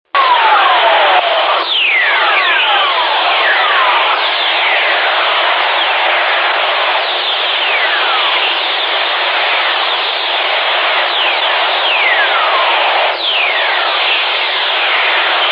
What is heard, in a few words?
earth lightning, Real Outer Space Noises